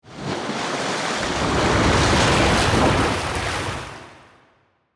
Object Surface, Water, Large, Object, Surface; BlastWave

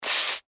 Sci Fi Air Expel Hose Pneumatic Burst; Sci Fi Air Expel Hose Pneumatic Burst Science Fiction; Spacecrafts

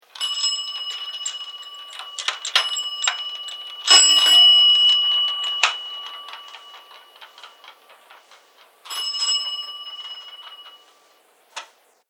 Bell Bicycle Passing; Bicycle Sound Effects; Bicycles (Sound Effects:Vehicles)

